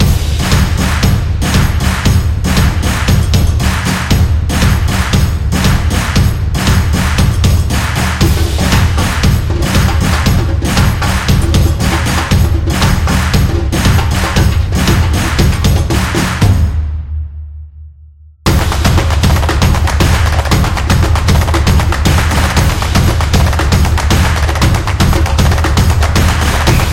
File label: Samba Drums; sounds of the world